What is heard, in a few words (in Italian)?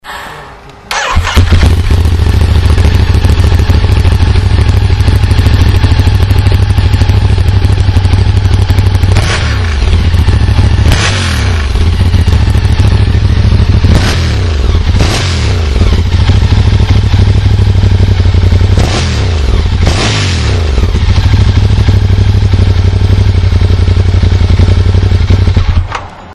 rumore motore ducati diavel